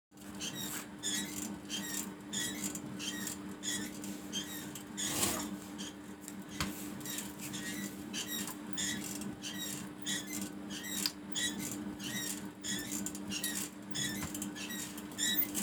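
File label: long sound of a swing swinging fast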